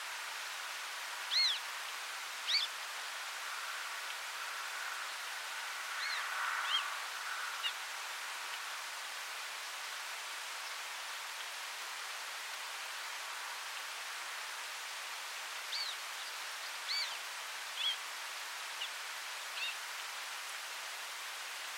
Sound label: Circus ranivorus, Afrikaanse Bruinekiekendief; roofvogels; Accipitrinae; Accipitres; Accipitidae